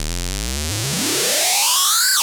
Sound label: SWEEP UP; JUST FOR FX